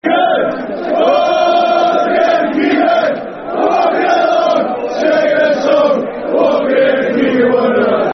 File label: Football Chant (Soccer Song), Newcastle United; Keegan Wonderland; Newcastle United Nufc